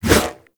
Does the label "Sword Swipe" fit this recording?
Yes